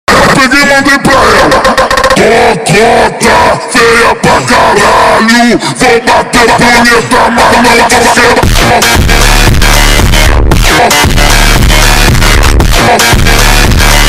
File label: montagem slowed version